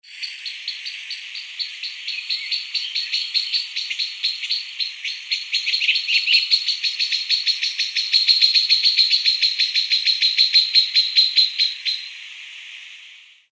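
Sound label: Dendrocincla turdina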